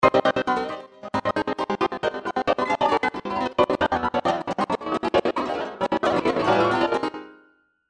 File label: trance midi loops gates; newtronic trance constuction kit